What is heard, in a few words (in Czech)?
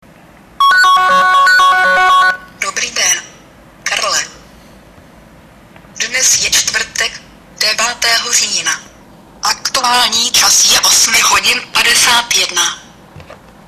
smarter alarm nahravka